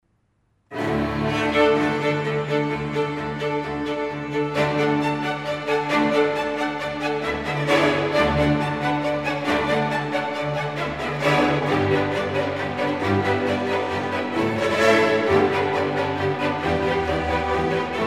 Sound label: Seven Romanian Folk Dances for orchestra; Bartok